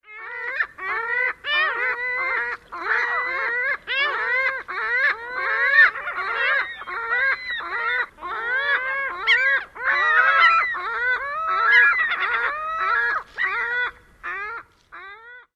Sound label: calls